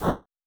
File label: gather wood; adarkroom